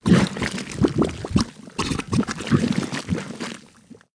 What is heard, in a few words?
quicksand; sfx wildwest